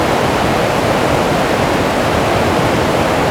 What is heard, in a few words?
Water Fall Air Loop; Warframe; PVP; CTF Orokin; Ambience